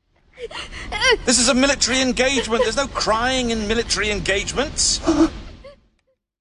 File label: crying; military engagements